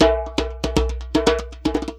DRM; Best Service ProSamples vol.52 - World Instruments 2; AKAI; MIX DRM LOOP

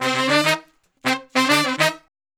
Horn Section

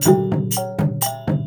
WYANG L, Heart of Asia

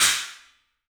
CYMBALS; 3D Sound; 3D Drums

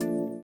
VIBRA; Spectrasonics; Metamorphosis

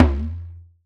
Talking Drum Up, Percussion; Roland BK-5

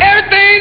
cry; Amiga Soundtracker sample

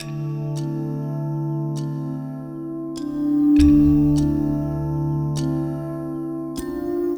Dalang Walk, Ubud Loop; Sonic Foundry (Sony Creative Software) - Friendly Fire

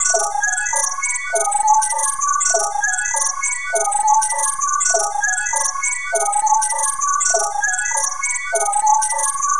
Budgibot Melodic, Tonal Atmospheres; Sonic Foundry (Sony Creative Software); Myths of Technology

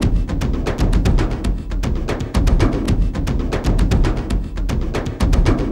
Vam, Frame Kendang; Sonic Foundry (Sony Creative Software) - Friendly Fire; Drums

